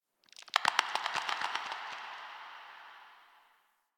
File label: Tendon Stretch Large Distant; Ambience; Misc Outdoor; Warframe; Infested Eris